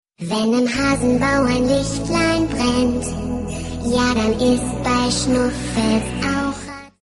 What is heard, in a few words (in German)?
weihnachtslied